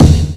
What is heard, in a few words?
BD, korgdrums; Korg Electribe; ESX-1; electribrary-3